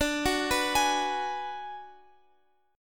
D 6th Flat 5th, Guitar, Open F; D6b5 arpeggiated; x,x,14,13,14,15; JGuitar

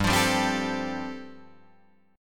G 7th Suspended 2nd Suspended 4th, Guitar Drop D

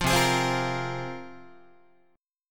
C 7th Suspended 4th Sharp 5th, down, Guitar; C7sus4#5 strummed

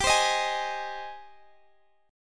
G sharp Major G, guitar chord; strummed

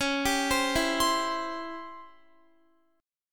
Db Minor Major 7th Flat 5th Db down Guitar; arpeggiated

